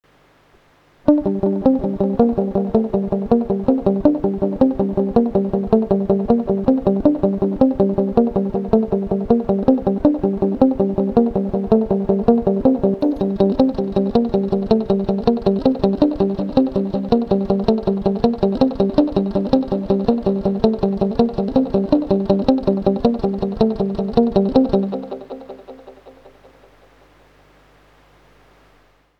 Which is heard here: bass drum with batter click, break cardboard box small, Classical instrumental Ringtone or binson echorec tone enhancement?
binson echorec tone enhancement